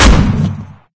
npc ar2 altfire, weapons; Source Engine; video game

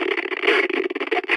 radio static; video games; train; source engine; portal2

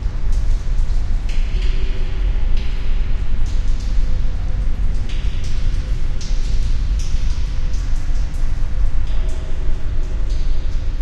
tone drips; video games; ambient; Source Engine; tf; lair

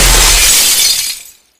glass hack; bts; Source Engine; Portal 2

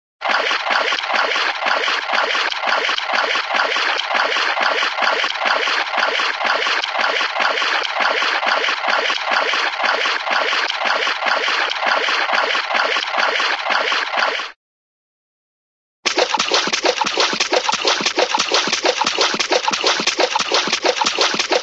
water, swimming smooth swimming splashes, cartoon

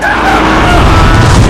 charge windup; tf

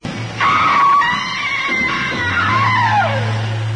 doors scream; Source Engine; nippersounds

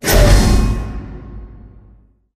piston start

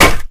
wood plank impact hard, physics

